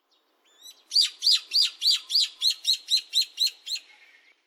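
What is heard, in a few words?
hiyodori, Brown-eared Bulbul; saitama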